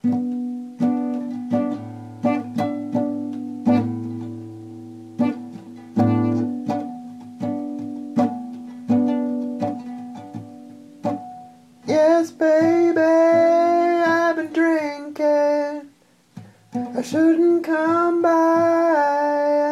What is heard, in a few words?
guilty acoustic sketch